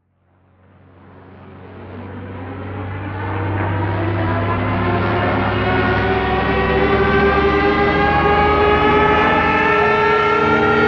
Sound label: Stuka dive flyby